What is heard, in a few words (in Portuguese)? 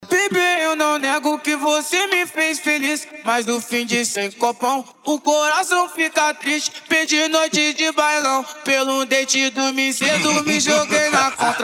funk grave